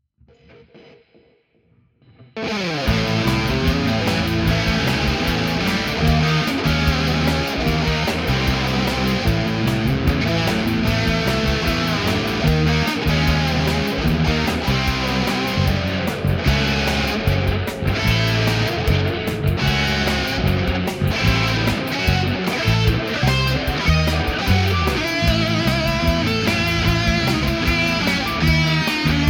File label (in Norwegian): riff